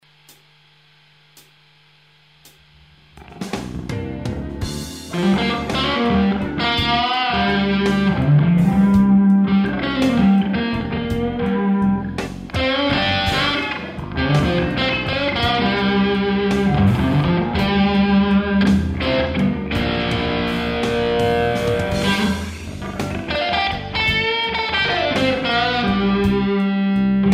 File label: dist, humbucker; CreamGen